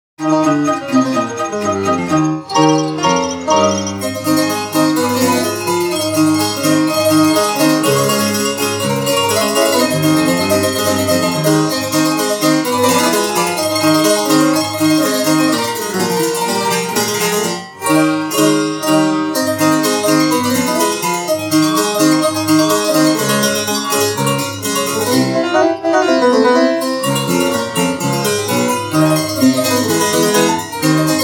Old Fasioned Auto Piano; Remix 2 Of 79572 Razzvio

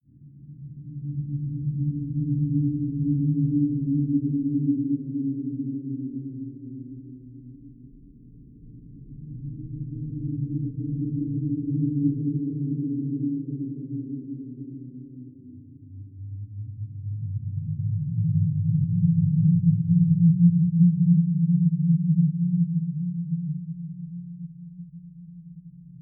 Horror Ghost Low

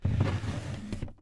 Wooden Drawer Open

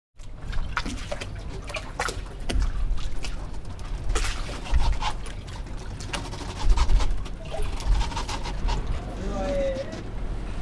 Fishing Boats In Harbour

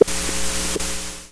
glitch; Tascam

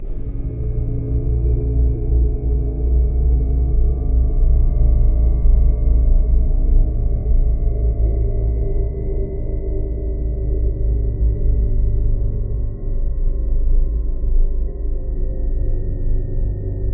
Creepy Horror Ambient Drone

